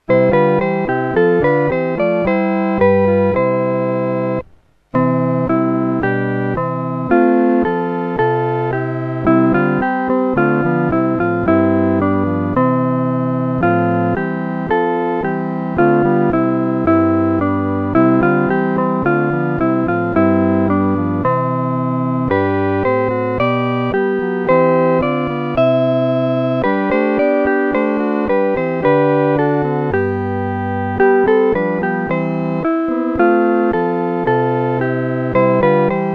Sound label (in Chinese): 合奏 四声部; 基督今复活